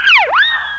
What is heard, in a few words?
Ricochet; Working Model Program